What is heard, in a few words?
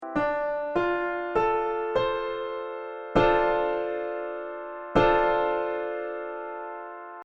Gt Dm7 piano